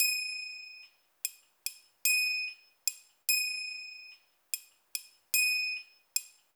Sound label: BOL FING CYM, ETHNIC INST; Spectrasonics; Liquid Grooves 1 & 2